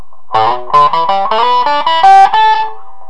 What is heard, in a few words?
Boost; WMouse